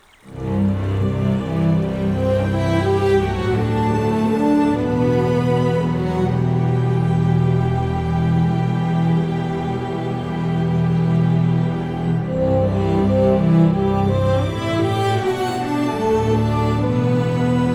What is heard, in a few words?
Reprise (Orchestral)